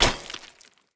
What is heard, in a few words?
Sphere Polearm Medium Impact Rock, weapon impacts; Player; Environment